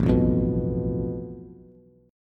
A Suspended 2nd Sharp 5th, Bass; strummed; JGuitar

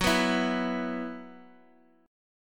Gb 7th Suspended 4th Gb down, chord

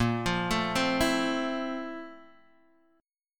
F Major 7th Bb, chord; Fmaj7/Bb arpeggiated; JGuitar

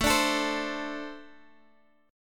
A Minor Double Flat 5th, Guitar Standard; Ambb5 strummed; 17,17,19,17,x,x; JGuitar

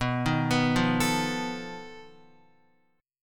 B 7th Flat 5th B Guitar; B7b5 arpeggiated; x,2,3,4,5,5; JGuitar

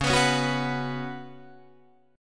Db 7th Suspended 4th Db down Guitar Drop D

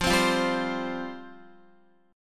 F Suspended 2nd F down Guitar; Fsus2; strummed

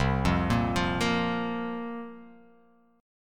C 7th Suspended 4th, chord

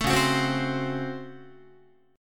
C sharp Major 7th Suspended 4th Sharp 5th, Guitar; C#M7sus4#5 strummed; JGuitar

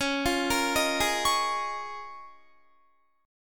C sharp 6th Add 9th C sharp Guitar; C#6add9; arpeggiated; tuning=4E,4A,5D,5G,5C,6F; guitar chord

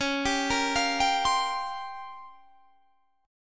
A sharp Minor 6th Add 9th C sharp, Guitar chord

